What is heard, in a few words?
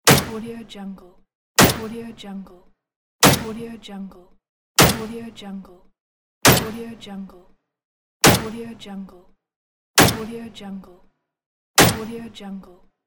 gun shot; بازتاب شلیک متناوب; game sounds